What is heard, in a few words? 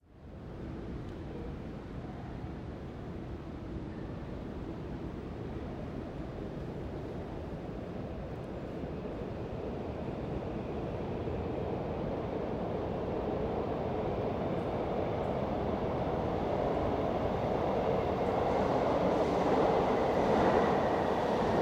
DC Metro indoor; Washington DC